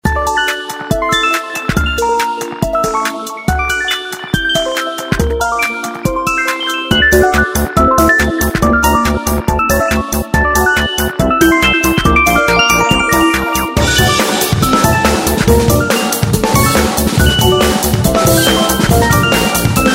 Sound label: High tide; Samsung